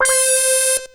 Synth hits and riffs

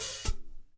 OHH; Spectrasonics; Liquid Grooves

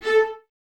Violas A; String Ensembles; A 4; Miroslav Vitous; LINKS ORCH

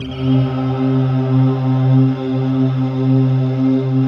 C2, RAIN CHOIR; Best Service; Advanced Media Trax Modern Composer

